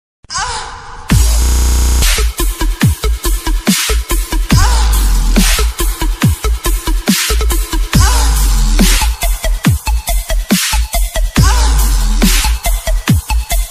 dubstep

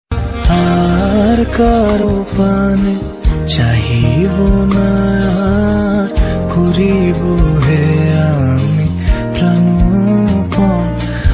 Rabindra Sangeet Bengali Song; r karo pane cahibo na

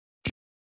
attitude ringtone; trending ringtone instagram; hanuman attitude

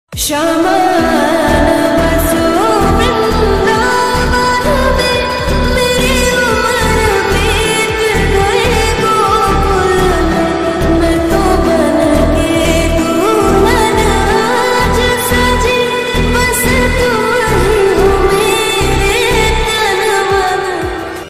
bhakti ringtone; krishna

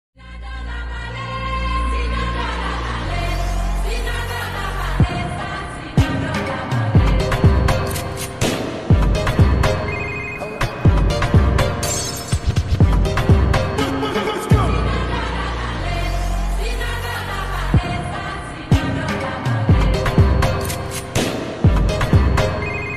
ringtone bgm ringtone

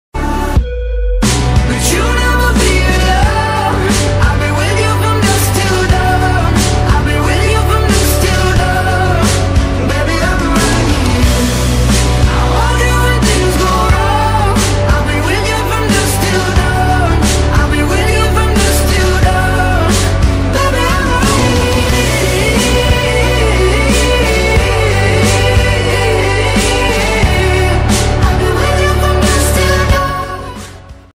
ringtone bgm ringtone

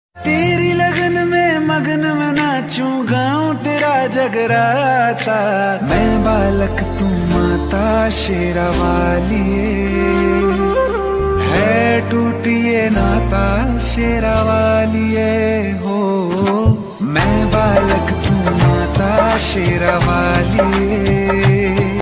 navratri ringtone; mata rani; durgapuja

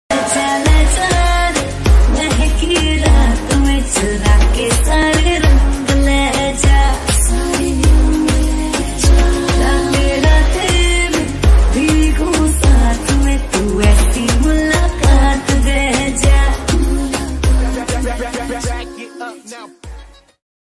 hindi song ringtone

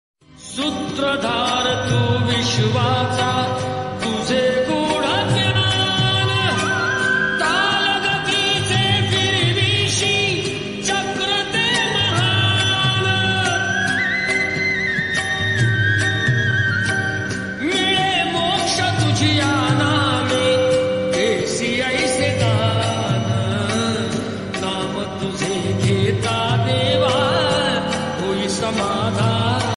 marathi ringtone

